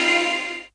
Vocal Oneshots; Vengeance Samples; Vocals

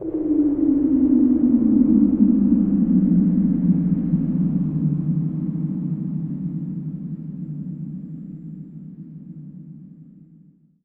Downlifter

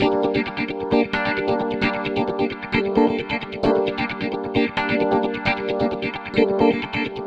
Special Sounds Root G 132 BPM; Vengeance Samples; VEH3 Special Sounds

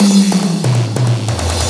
Loops Fills; Vengeance Samples

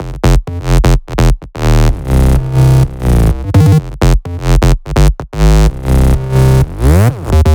127BPM Melody; Vengeance Samples; Opto Kit

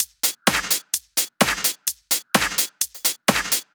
Drumloops 128BPM

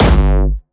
Jumpstyle Kick G1, vengeance essential bassdrums; vengeance essential club sounds-3; VEC3 Bassdrums

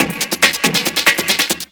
Breakbeat; Vengeance Samples; VEC2 Loops

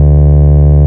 Bass D, Root D; Vengeance Samples; Vengeance Ultimate Bass

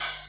Open Hihats, Cymbals; Vengeance Samples

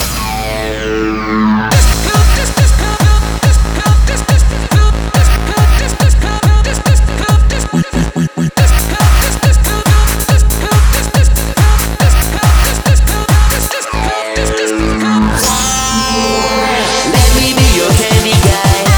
FX Vocal Candy Guy Mix, 140 BPM

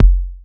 Bassdrums; Vengeance Samples

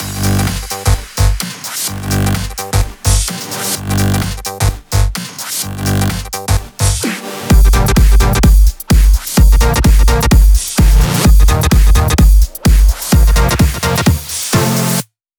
128BPM Machine Mix; VFH1 128BPM Machine Kit

